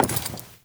Walk Wood, Footsteps; Characters; Enemy; Lady Deora

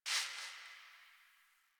Garbage Plastic Bag Rustle; Warframe; Ambience; Nineteen Ninety Nine; DE

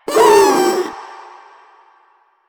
Vocal Short Attack; Warframe; Proto Infested; Runner

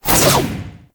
Backflip Whoosh; Enemies; Foley; Generic Foley; Warframe; Duviri; Dax

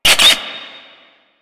Flying Robot Engine Stop; Warframe; Veilbreaker; Quests; Ambience; Kahl

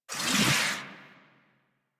Mod Cycle Enter; DE; Warframe; Riven Mod

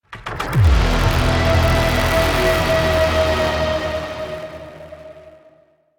Stinger; Corpus Lich Pet; Warframe

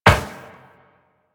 Run Metal Perforated, Footsteps; DE; Warframe; Tenno

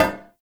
Stone Cafe Table Impact; Warframe; Nineteen Ninety Nine

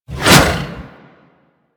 Scythe Whoosh; Weapons; Stalker; Warframe

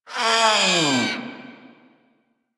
Feral Vocal Death; Warframe; Catbrow

